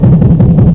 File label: car idle; cs petroleum